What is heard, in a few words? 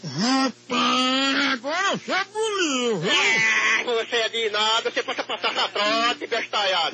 rhyeeeaa